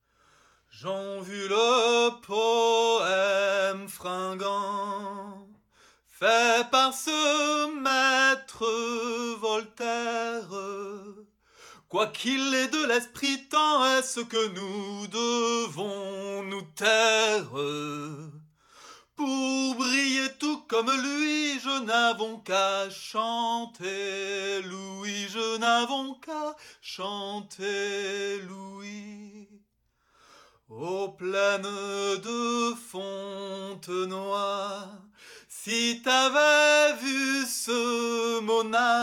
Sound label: Solo Men; assassin s creed unity gamerip 2014